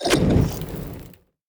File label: enemy lava evolved flame; sfx